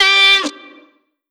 Omnivox oneshot B, Vocal; One Shots; Slate Digital Omnivox Vocal Sample Pack

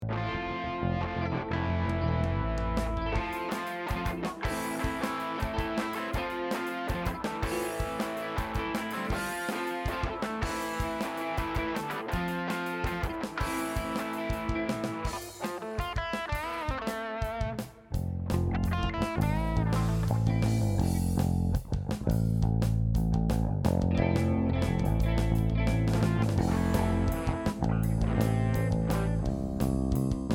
Strings Mix; long tease

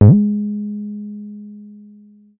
Synth oneshot Glide Up Ab, Synth Bass; Synth Bass One Shots; Slate Digital BASSHOLE Sample Pack

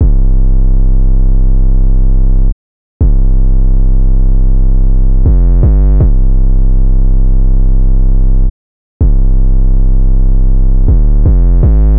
808 loop 160 Cm; Slate Digital BASSHOLE Sample Pack; 808 Loops

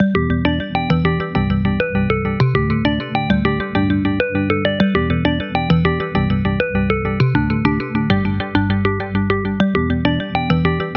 songstarter 100 G; Slate; SD PASADENA Pop Sample Pack

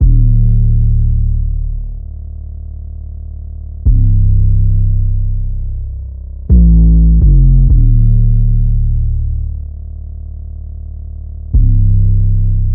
808 loop 125 Dm; Slate Digital BASSHOLE Sample Pack

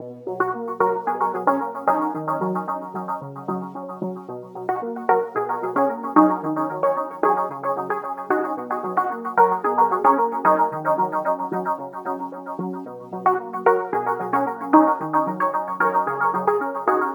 songstarter 112 Ebm, Pop; Slate; SD PASADENA Pop Sample Pack

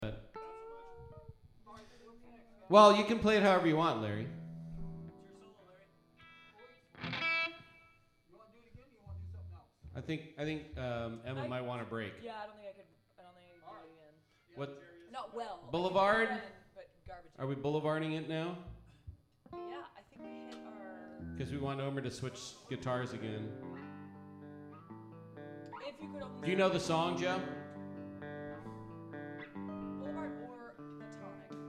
vocals

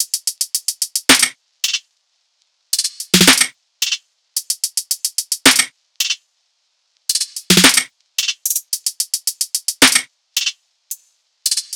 tops 110, Drum Sample Pack Loops Top Loops; SD SEISMIC; Slate

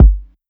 Kick Tighten Up, 808 Kicks; Slate; Vintage Drum Machines Volume 2 808 Edition

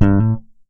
Synth one shot Slap Gm, Bass; Slate Digital BASSHOLE Sample Pack

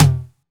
Tom Slap, 909 Toms; Slate; Vintage Drum Machines Volume 3 909 Edition

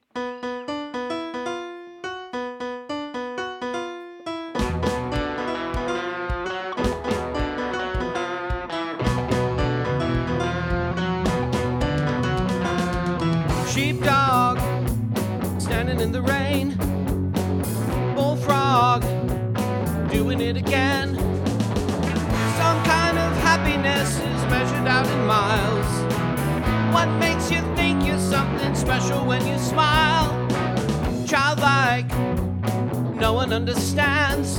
vocals

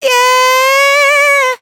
adlib Hey Cry, Vocal, Dry Vocals; Raw Vocals; Slate Digital Omnivox Vocal Sample Pack; Adlibs

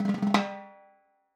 timbales High Roll; Raw Perc One Shots; Slate; SD SEISMIC Drum Sample Pack

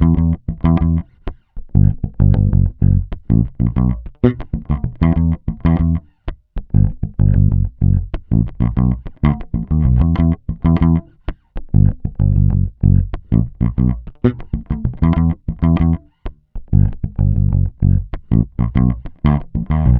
Bass loop 96 Em, Electric Bass; Electric Bass Loops; Slate Digital BASSHOLE Sample Pack

